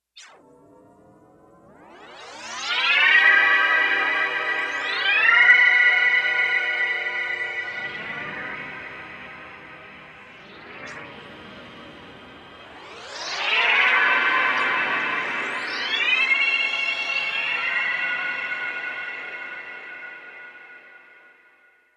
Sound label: Spaceheads, synth